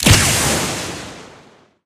arm shot, weapons; barret; tf

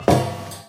metal grate, footsteps

